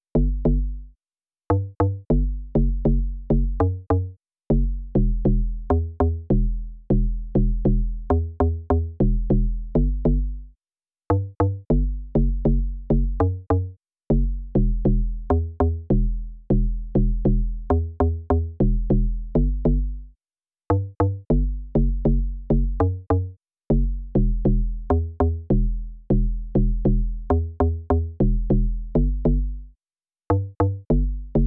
100 BPM E Min Bass, afrobeats; Melodies; Loop Stems; Cymatics; GHOST (Beta Pack); Alora

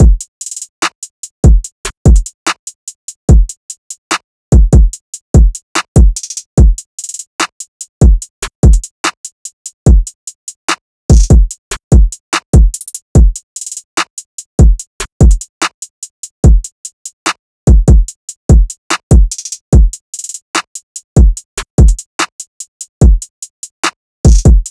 Drum Loop 146 BPM; Bands; Cymatics; Mystery Pack Vol 4

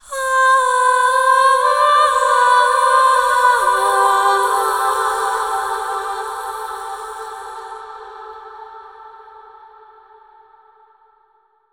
Vocals Am 65Bpm (WET); Construction Kits; Ghosthack; SkyRealm; Kit 22 - Solace in Falkreath

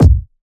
Kick, dubstep; Demolition - Dubstep Samples; Drum Shots; Apocalyptic Kick

